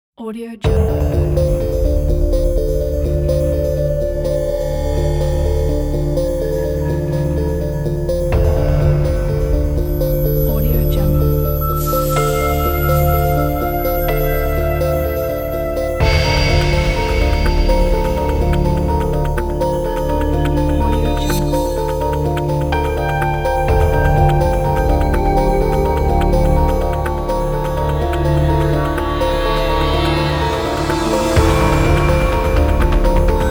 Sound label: tense